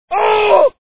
argh

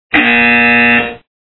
buzzer

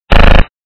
gun shot

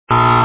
penalty

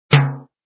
low tom

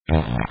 fart

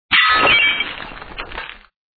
glass

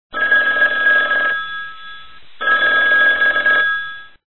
phone

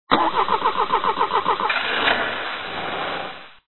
ignition

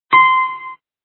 piano

